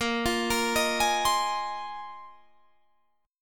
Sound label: Bb 7th Suspended 2nd Suspended 4th Bb down Guitar Half Step Down; arpeggiated; Bb7sus2sus4